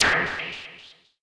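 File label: kawai k4 sloppy synth, snare drum; Polyend; Legowelt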